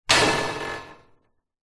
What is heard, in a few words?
household metal fireplace guard door close; zapsplat